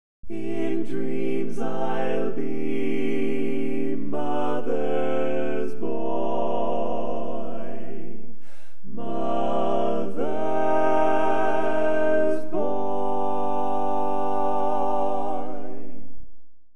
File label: Tenor